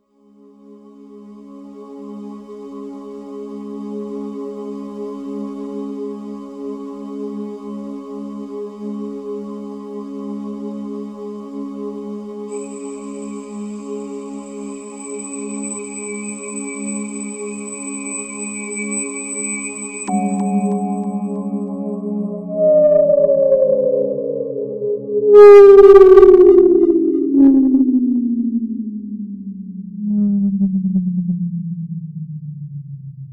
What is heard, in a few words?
Db drone sweep